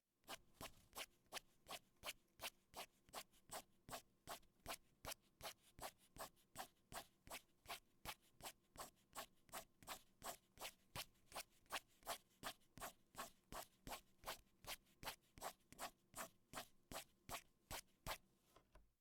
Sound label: scraping on paper